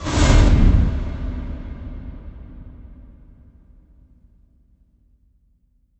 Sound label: impact flame, Cinematic Impact